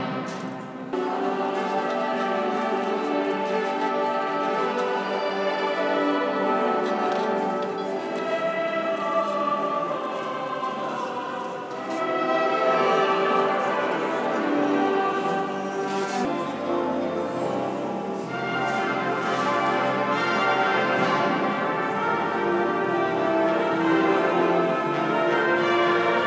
some wicked christmasy big band choir going down in ely cathedral